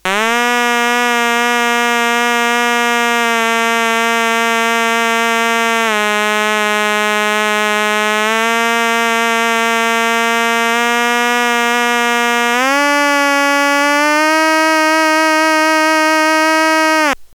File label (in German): Otamatone Deluxe simple Melodie